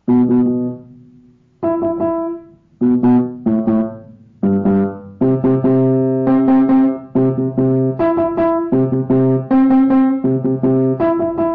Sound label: Fanfare